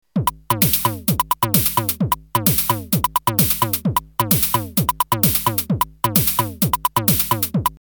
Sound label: synthesized drums